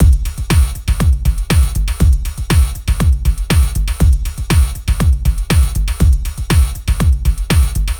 4 floor beat, trance

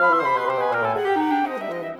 Winds, Orchestral; Sonic Foundry (Sony Creative Software); Orchestral VOL-2; Modern 19

